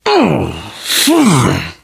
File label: hurt major, biker; voice